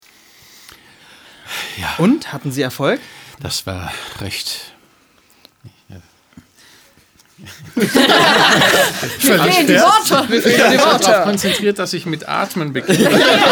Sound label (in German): ohne Worte